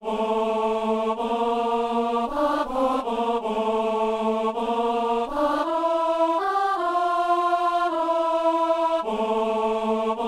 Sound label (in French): messe simple sanctus alto